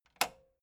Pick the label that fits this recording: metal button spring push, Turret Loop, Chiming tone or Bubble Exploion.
metal button spring push